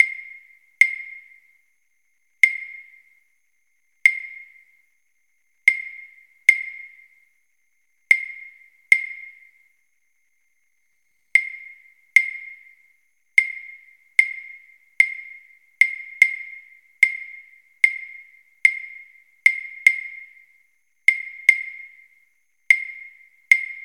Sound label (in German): Rhythmus Grundlagen 74 Bpm